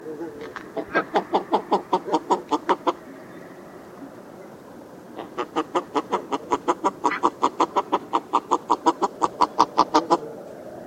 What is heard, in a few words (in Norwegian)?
PRAKTÆRFUGL HUNN SANG